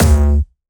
D2, Jumpstyle Kick; Bassdrums; Jumpstyle Multis; vengeance essential club sounds-3; VEC3 Bassdrums

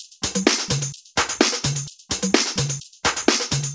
Minimal Loops

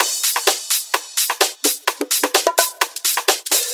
Latin House Loop 128 BPM; Vengeance Samples

